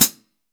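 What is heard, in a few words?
MIX foot hi-hat, OLD HH; AKAI S-Series CD-ROM Sound Library VOL-3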